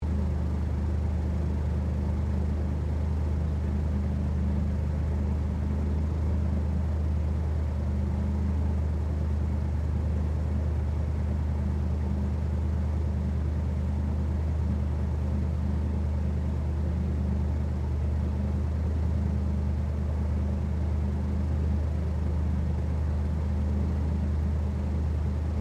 Airboat Run Slow, Transportation, Watercraft, Airboat, Run, Slow, Speed, On, Board, Perspective; Loop